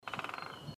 fuglelyd

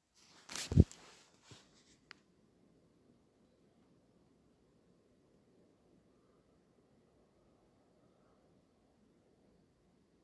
natt fuggel

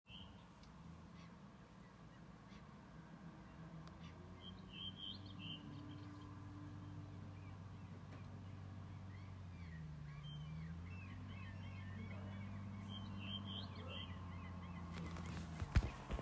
fugl rissa

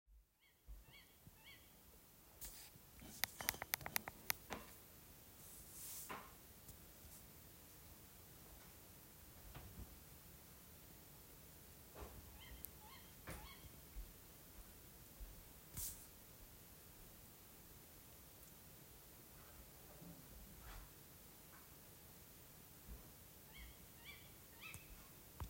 fugl oppe pa lyfjell midnatt

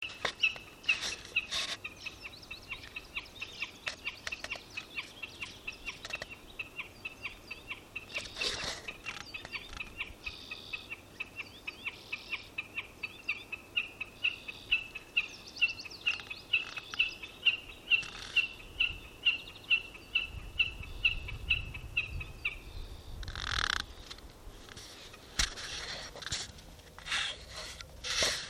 fugleopptak

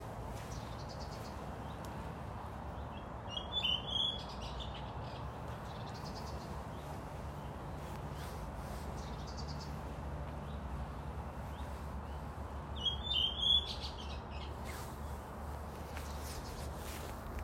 valdresfugl

